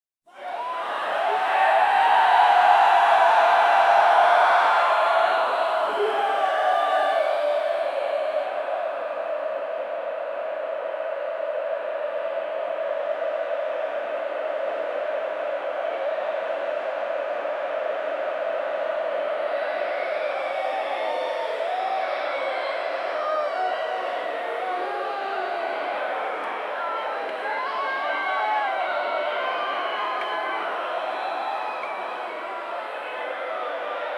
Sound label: peaceful sea again cheering